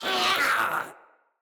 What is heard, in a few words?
Infested Runner Attack Call